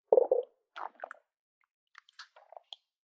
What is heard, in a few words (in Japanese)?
お腹のぎゅるぎゅる音